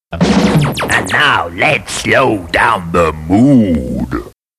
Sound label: jingles, slow down; MAR Jingles; Original MAR Jingles